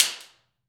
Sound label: SLAP STICK; AKAI S-Series CD-ROM Sound Library VOL-5